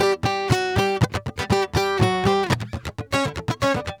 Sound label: GUITAR SALSA